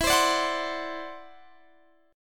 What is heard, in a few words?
E 7th Suspended 2nd Sharp 5th E down Guitar Drop D